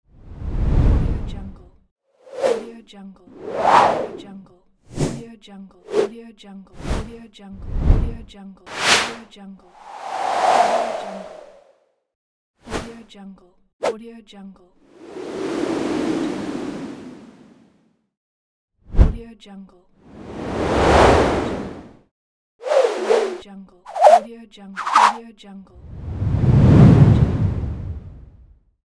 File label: whooshes